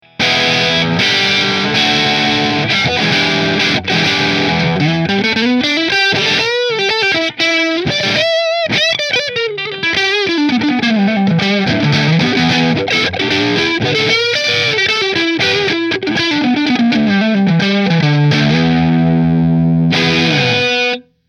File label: Nola JB14 Neck Marshall, guitars